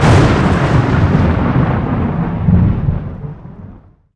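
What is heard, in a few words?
thunder, nature weather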